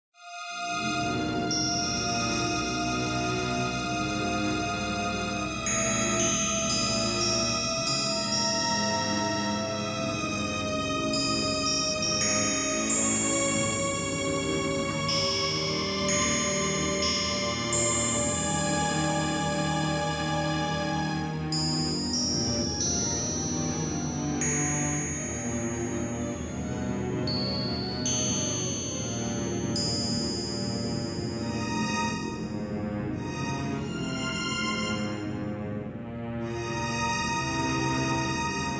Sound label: Junt Lively Wildlife, BGM Music; BGM Music to Lively Wildlife on Planet Junt in the Luminous Green Nebulawhich is in the Corobos System; Tesseract Prime